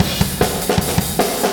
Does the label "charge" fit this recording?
No